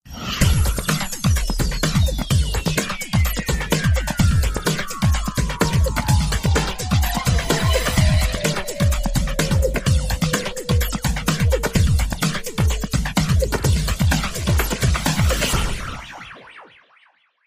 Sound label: Drums FX